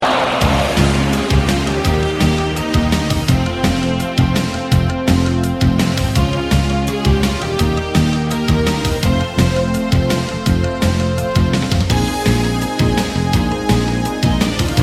ringtone; espn

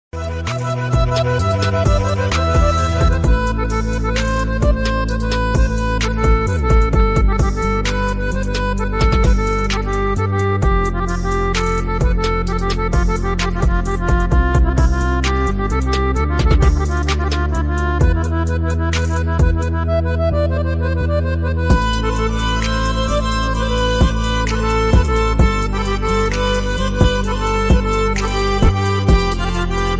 soulful background music

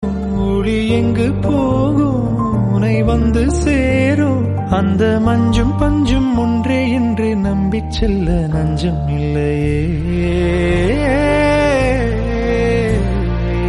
tamil; maamannan

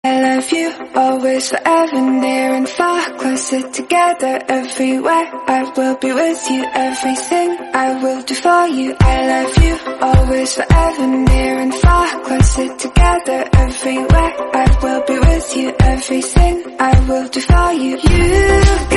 instrumental, bgm, english

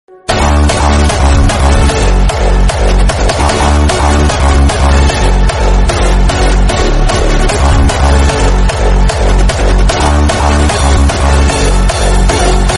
background music; rajahuli

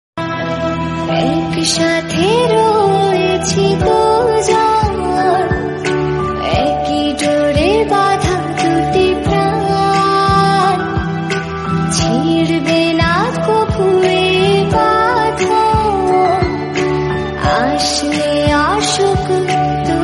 female version bengali song

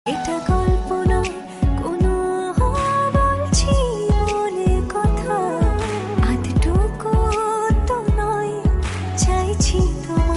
bengali romantic song

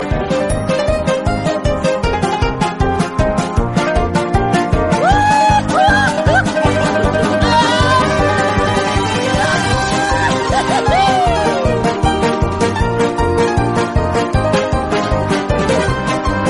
music; bad piggies

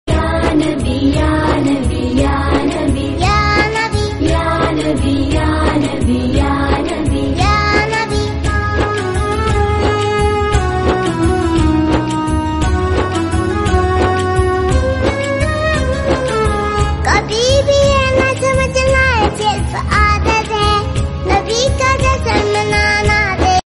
islamic song

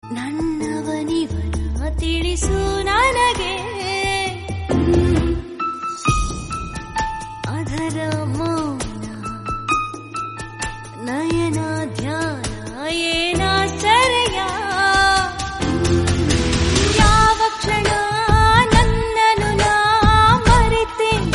kannada feeling song

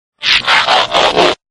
babe laughing sound notification

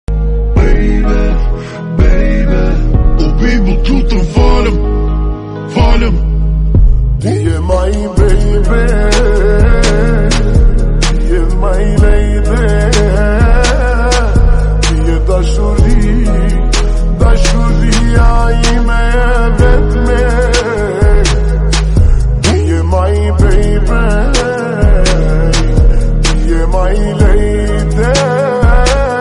instrumental turkish

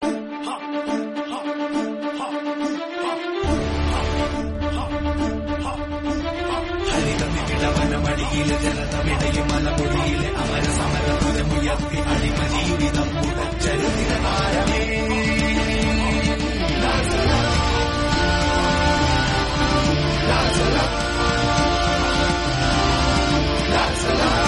background music; aadu 2; jayasurya

